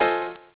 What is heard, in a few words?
beep jazz piano; sound effects